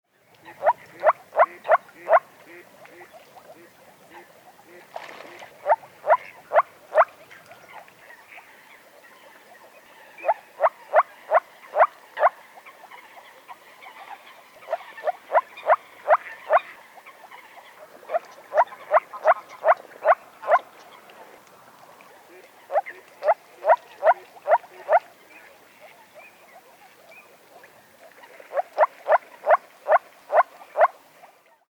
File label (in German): Moorfrosch Paarungsrufe